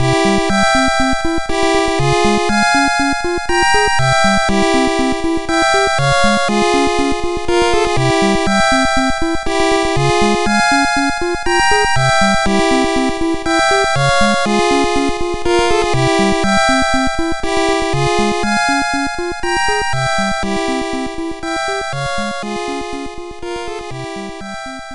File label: Bonus Stage Fast